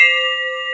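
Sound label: Finger bell, Roland JD-990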